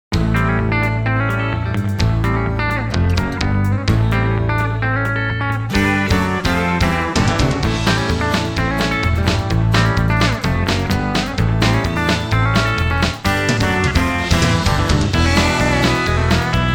Victory; Victory Pickup

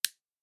switch

